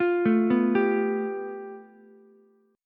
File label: A 7th Suspended 2nd Sharp 5th, Ukulele; A7sus2#5 arpeggiated; JGuitar